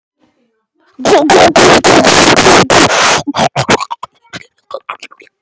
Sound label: fart big loud